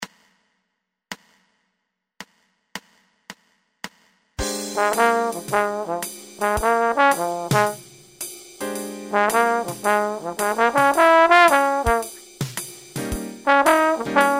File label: swing no bass